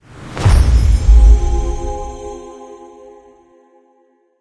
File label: angelic level up; smite; thanatos